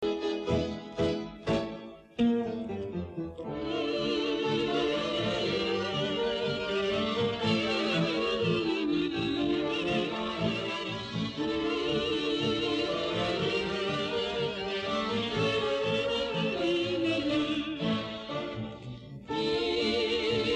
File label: Old Ukrainian tango